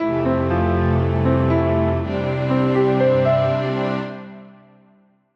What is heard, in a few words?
jingle stream end